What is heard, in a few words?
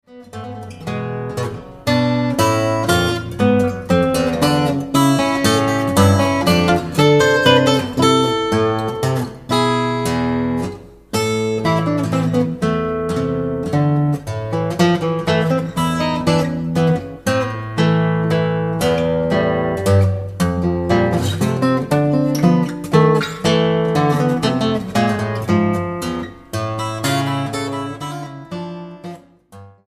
Waltz